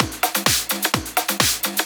Clubhouse Loop 128 BPM

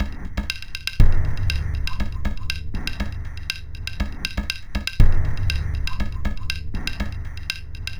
Minimalism, Processed Percussion

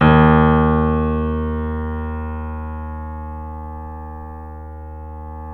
The Piano, D#; InVision Interactive - The Piano

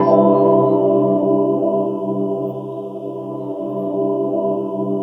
SUGAR HIT

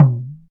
DM5 Perc, Alesis DM5 drum machine

